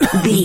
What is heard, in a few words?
voice male cough short